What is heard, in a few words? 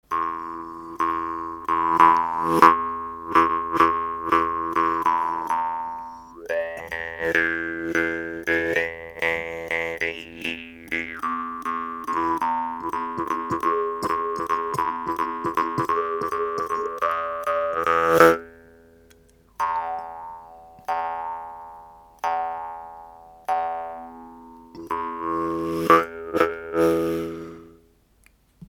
ZUNGLA, murchunga